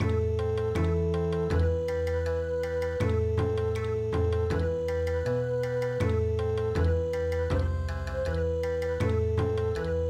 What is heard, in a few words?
Bon Odori, midi; Sakura